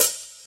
Open Hhat, Hihat; Equipped Music; Loungin House

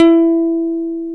E 2, PICK BASS; AKAI S-Series CD-ROM Sound Library VOL-7

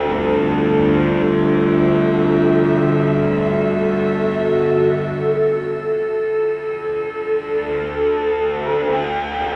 Elektro Mass, Elektrik Drone, Sky Church; Sonic Foundry (Sony Creative Software) - Friendly Fire; DroneLoop

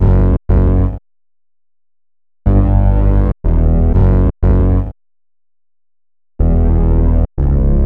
Bass Synth; Sonic Foundry (Sony Creative Software); Club Joints and Street Anthems; Razor Edge

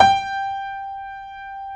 JAZZ G4, Keyboards Acoustic; AKAI; USB Soundscan vol.17

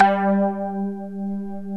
BOTL MARIMBA; OMI - Universe of Sounds

